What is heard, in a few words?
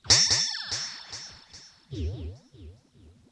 RIPPLES L, Funk Guitar; 90 s Sample CDs; Zero G